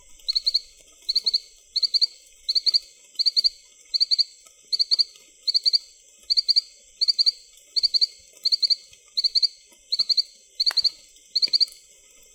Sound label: Allobates nunciatus call